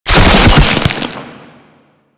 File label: Maggot Pain; Enemies; Vocals; Warframe; Proto Infested